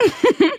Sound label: giggle; bb-nine